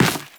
Run Dirt; Footsteps; Warframe; Teshin

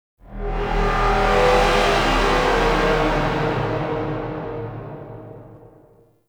Sentient Vessel Marrowtick Launch Delay; Warframe; Tau; Abilities

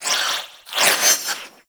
Scoop Idle Spin; Warframe; Missions; Tenno Ball

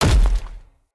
Step Default, Footsteps; Enemy; Nimrod

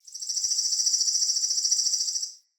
Forest Open Glade Bird Med; Day

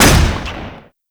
Rifle 2D; Warframe; Weapons; Bolto Rifle

